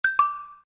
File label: send failure; Coolpad S60